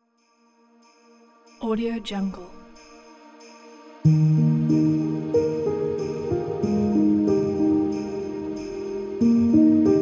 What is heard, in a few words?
peaceful sleigh ride; coresound